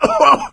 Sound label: cough, player survivor voice; source engine; left 4 dead 2